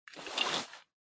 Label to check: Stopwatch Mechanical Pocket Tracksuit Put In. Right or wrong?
Right